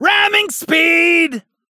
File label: Grinding Pipes